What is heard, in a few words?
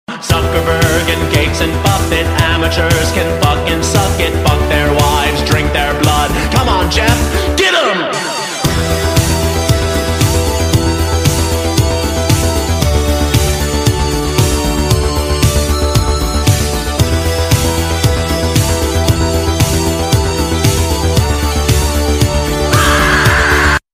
English Attitude BGM Ringtone